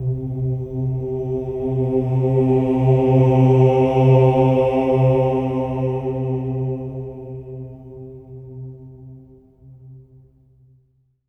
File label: OH C3, Symphony of Voices, SWELL OHS; Spectrasonics